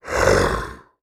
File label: Animal Mammal Carnivore Cat Tiger Roar; animals; AdobeCC